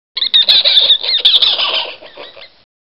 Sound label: spider monkey